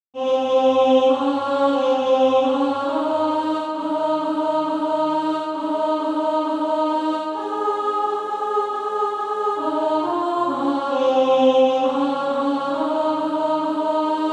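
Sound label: alto, Sheet music (SATB) including Practise Music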